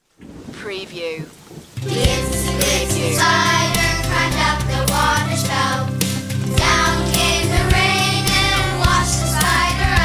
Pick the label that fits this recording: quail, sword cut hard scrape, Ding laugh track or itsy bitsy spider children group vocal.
itsy bitsy spider children group vocal